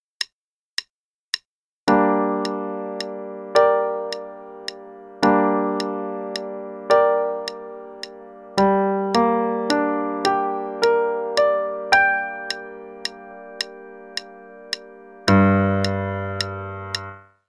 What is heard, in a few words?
Gm Chords (qn=108); Level 2A - 2nd Ed - page 56; Applause for Gm Chords